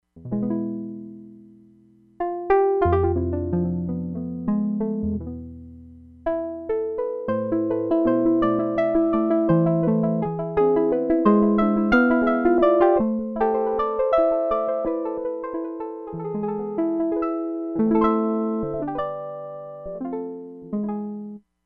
CLASSIC GUITAR, Crumar Bit 99; Factory Programs

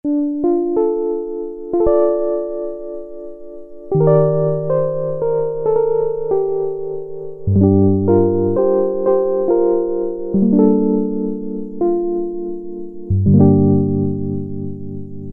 E. Piano with Tremolo, Roland Juno-106; Factory Preset

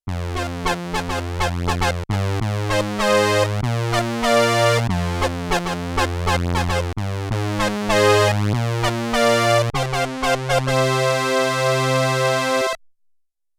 Dual Prophet Brass, Prophet 5; Yamaha TX16W

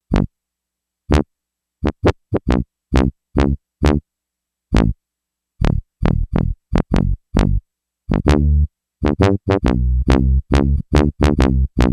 Tuba

